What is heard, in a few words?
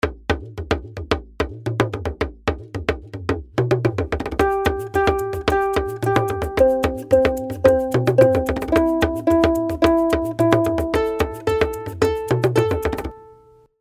WITH UKULELE; Rainbow Ukulele